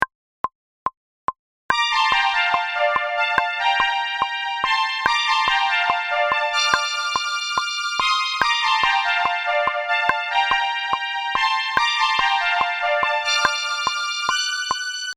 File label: Sub